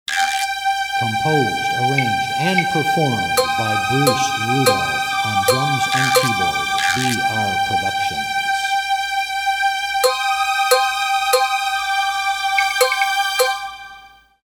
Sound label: string hold contemplative and mysterious